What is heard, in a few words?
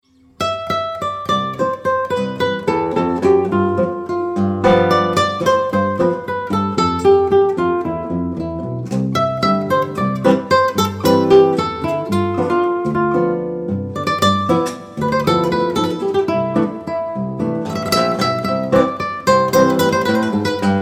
Guitar